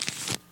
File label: seed lift; soundspvz